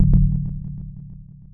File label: error